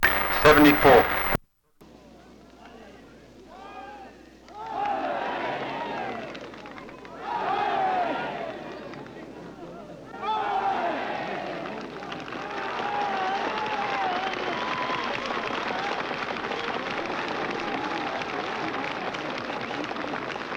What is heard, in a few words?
Bullfight Crowd Ole